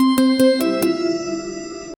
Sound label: level up sound effect maj